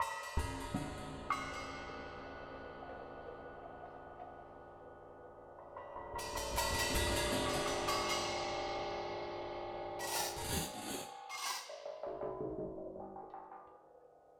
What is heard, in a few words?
Kabuki Metal, Rhythmic Atmospheres